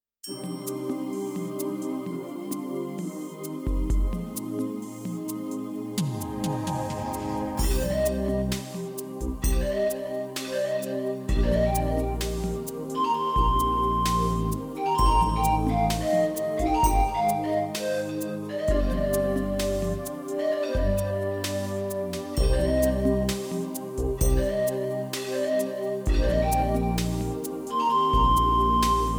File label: 16-beat